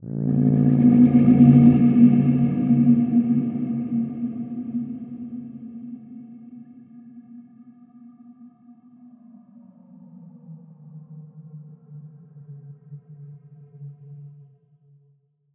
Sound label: Atonal Depths, Psytrance